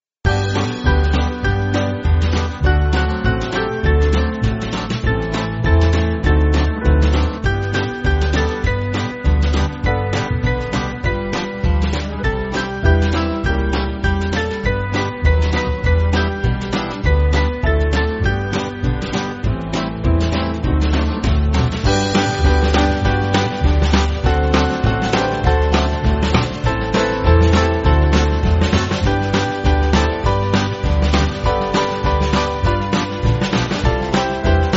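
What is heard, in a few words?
Band, Backing Files for Churches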